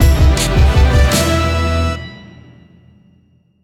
Sting Victory; Full Metal Furies

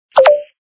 sound button unable; Monster Arena

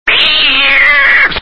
SFX sound (cat hit)

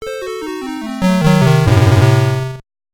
Mahjong You Lose; animal crossing full gamerip

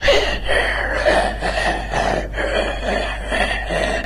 Zombie running walk; guns n zombies 2014 pc

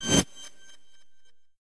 Sound Promotion In; Peace, Death

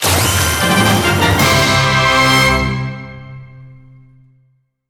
Game Clear; Super Mario 3D World + Bowser's Fury

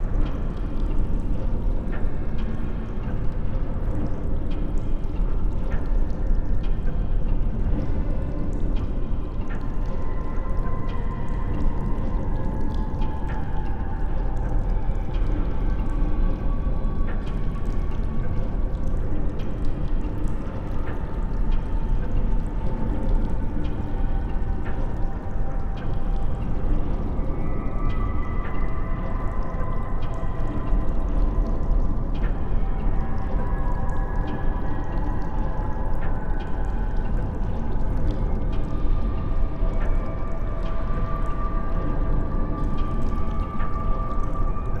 Ambient Water station Drops Loop; Lucius II The Prophecy

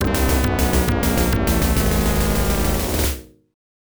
Miss; King Salmon; Genesis

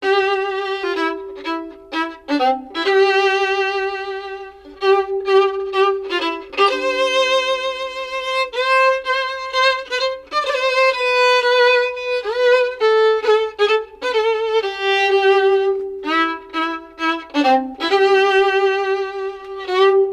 Violin; Assassin's Creed Syndicate

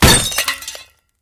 Metal Pipe Destroyed; Angry Birds

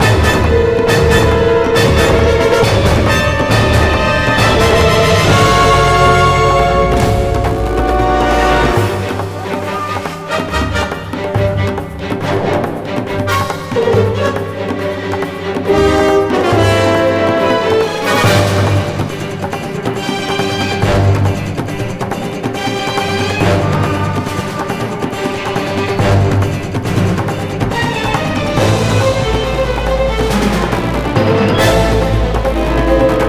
High Combat; Star Wars The Clone Wars Republic Heroes; wii

